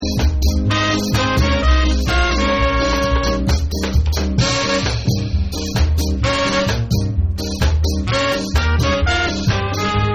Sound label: Ska Beat